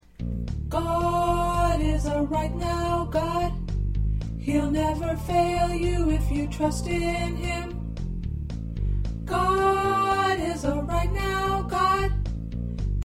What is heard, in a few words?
Tenor